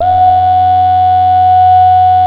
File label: BEGIN LOOP, Synth and Organ